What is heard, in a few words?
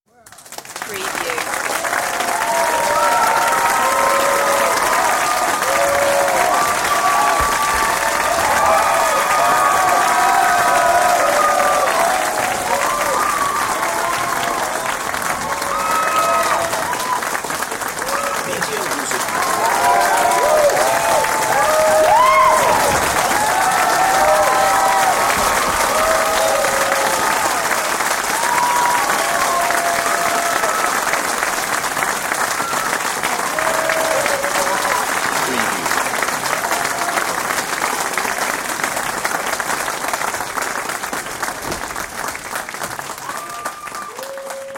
Big Crowd Audience Applause; beanstalk audio